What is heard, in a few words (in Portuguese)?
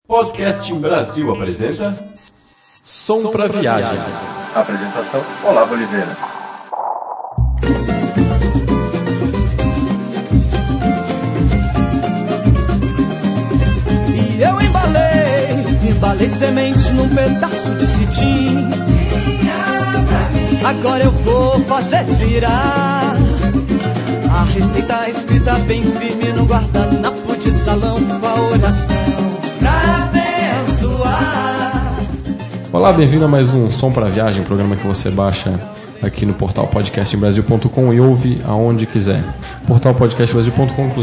samba; podmusica